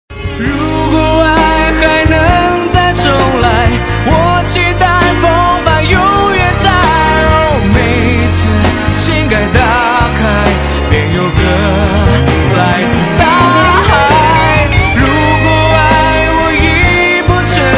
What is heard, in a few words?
piano